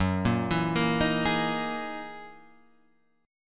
F sharp 13th F sharp Guitar Standard; arpeggiated; JGuitar

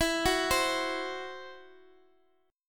E Suspended 2nd Sharp 5th E, down, Guitar, Open G

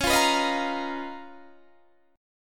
C sharp 13th C sharp, Guitar, Standard; strummed; C#13; JGuitar

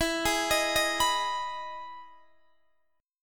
E Major 7th E down Guitar DADGAD

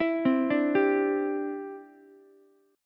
E Minor 7th Sharp 5th, Ukulele; arpeggiated; 9,12,10,10; JGuitar

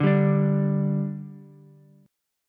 G 5th G Ukulele, chord; G5 strummed

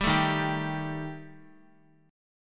Eb Major Flat 5th Eb Guitar; EbMb5 strummed; JGuitar

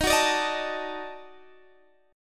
Eb Minor Major 7th Flat 5th, Guitar Drop D

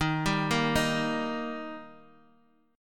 D sharp Major; 8,7,6,6; 4G,5C,5E,5A; chordshape

